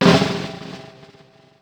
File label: Snare Roll, Ensoniq ASR-X Pro